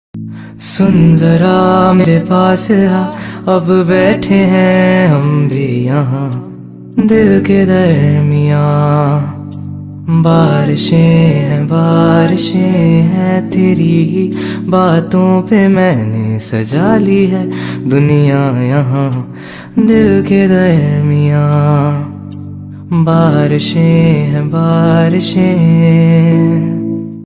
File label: Ringtone